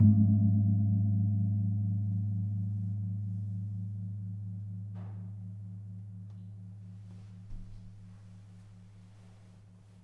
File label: Kempul Pl 4, gamelan; gravityharps; timbrebox